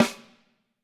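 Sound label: snare drum, Drums; Bob Clearmountain Drums I; SD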